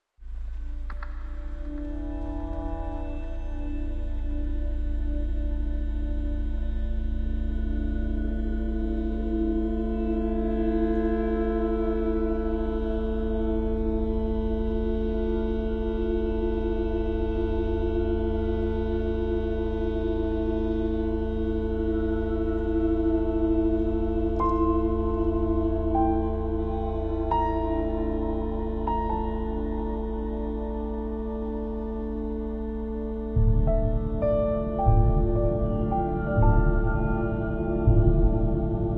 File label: Ghost Forest